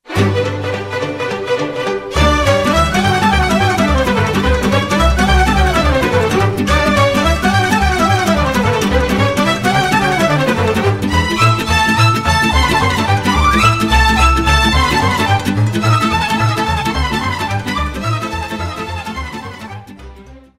Sarba si hora boereasca, Roumanie

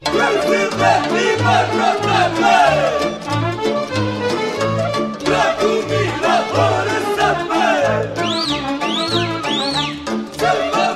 Suita de Jocuri Populare de pe Valea Putnei - Roumanie

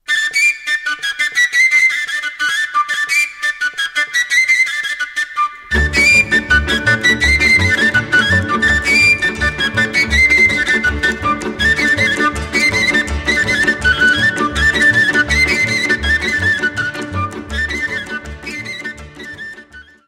Taraneasca - Roumanie

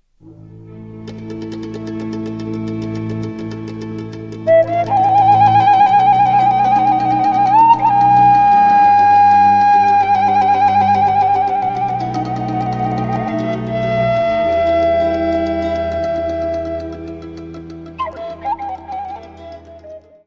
Doina din Caval, Roumanie

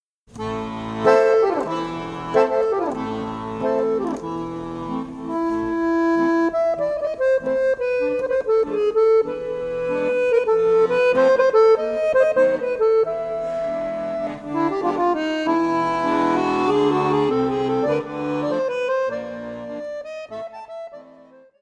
Hora si Breaza, Roumanie

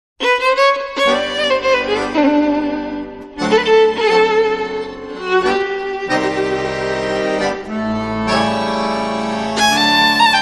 Sarba Din Concert; Roumanie

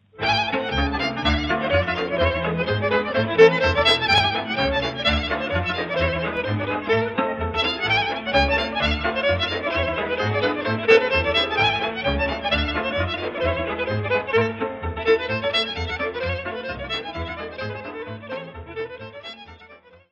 Tiganeasca - Roumanie

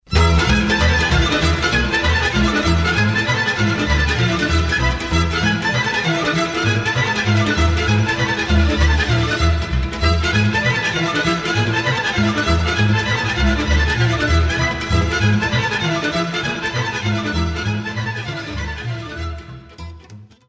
Sirba, Roumanie

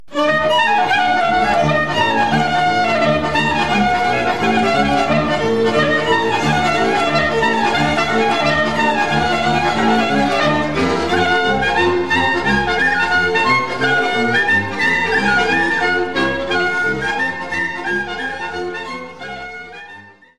Sirba, Roumanie

